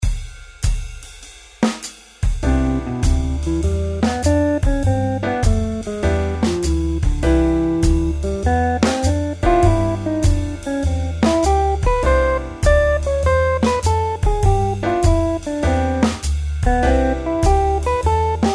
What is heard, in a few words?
Scale; Tomosax